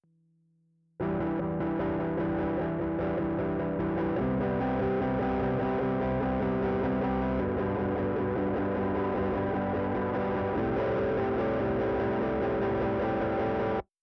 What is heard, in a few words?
Guitar